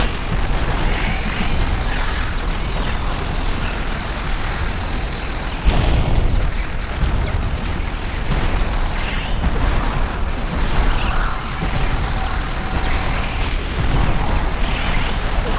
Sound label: Frenzied Firing Loop